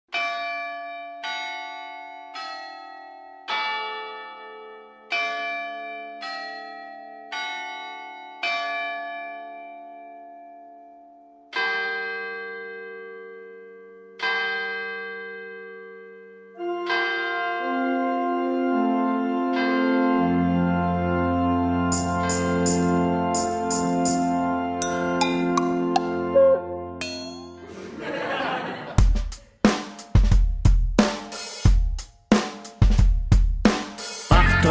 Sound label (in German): Weihnachts Hip Hop